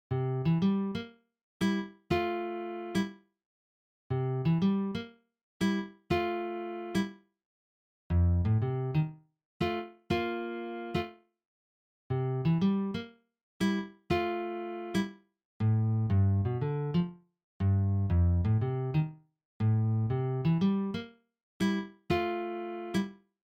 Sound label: BLUES TOOL